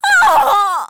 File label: dead female; character